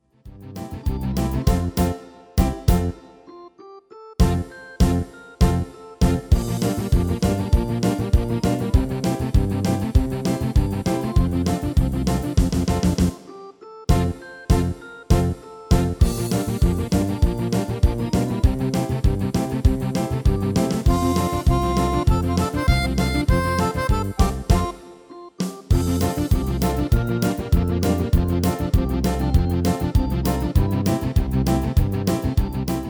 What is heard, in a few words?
MIDI